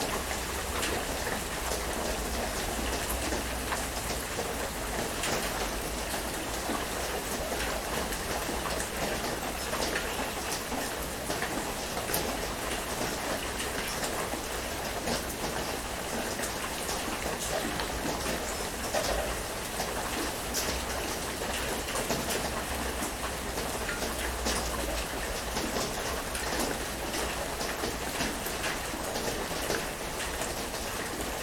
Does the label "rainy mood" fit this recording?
Yes